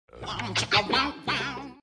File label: bom chicka wah wah